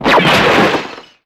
Coyote, Drum&Bass Hits & Bits; Total Drum&Bass; 90 s Sample CDs

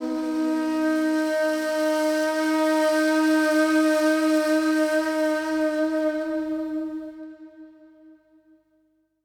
SIERRA FLU

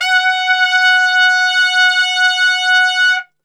F#3 PIC STR, Brass; Best Service; Brass Super Section

